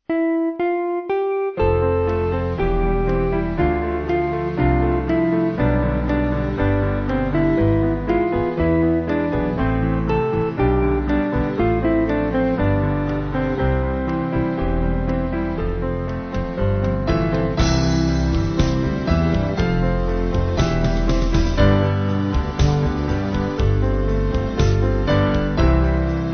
Piano

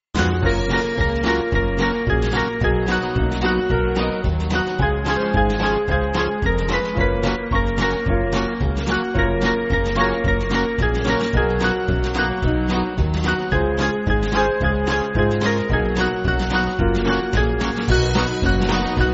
Band

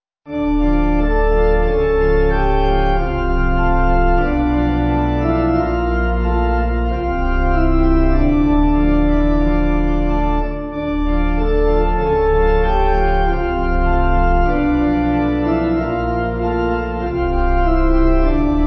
When His Time Was Over Pipe

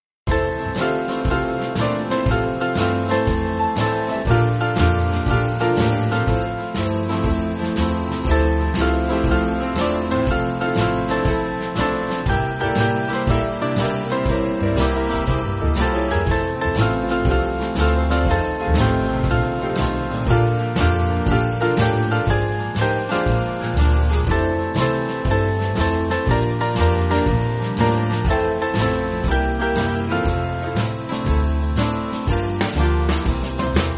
Band

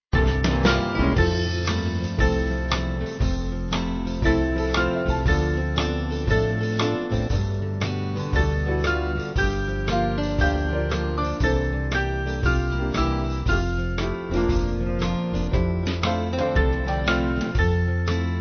Band

